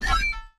Health Pickup

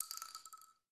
Snd Shell Land2; Red Hot Vengeance

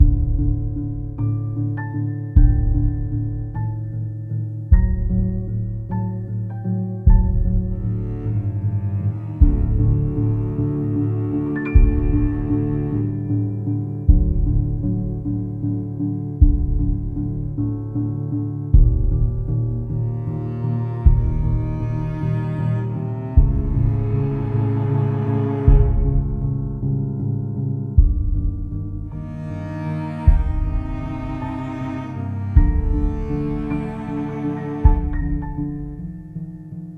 BGM Tension; the letter a horror visual novel switch gamerip 2021

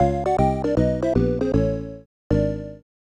you lose; Jammes; SNES

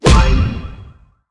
Quest complete; Streets of Rogue

